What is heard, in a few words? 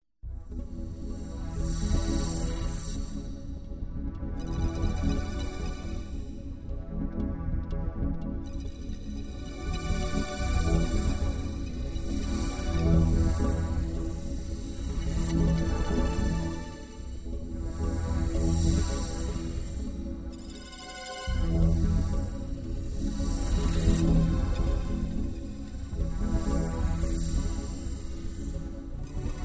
pad mbient, Yamaha TG-500; Pad: ambient 1